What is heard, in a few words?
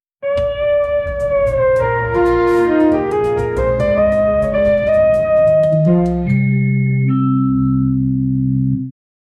background music light, upright bass